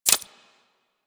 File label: Credit Pickup; UI; Pickups; Warframe